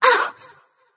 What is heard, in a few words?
ouch light; smite; voicepack; hel